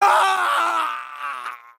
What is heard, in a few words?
impaled scream; mk9; shang tsung